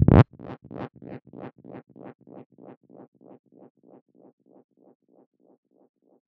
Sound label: Squelch F 144 BPM, Psytrance; Ghosthack; Psytrance Visions Volume 2